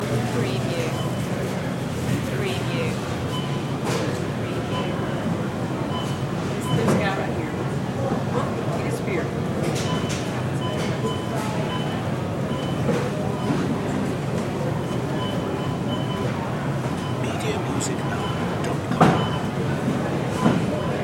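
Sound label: Grocery Ambience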